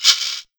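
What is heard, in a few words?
shaker, Ragga & Dub Reggae; USB Soundscan vol.27; AKAI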